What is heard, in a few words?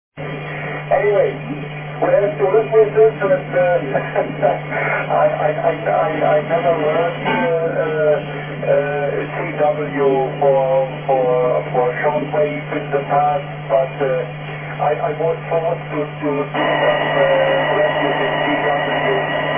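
40 m QSO interference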